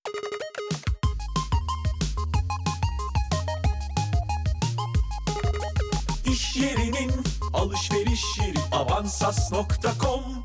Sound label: jingle; avansas